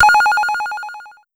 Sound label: Pollard Syndrum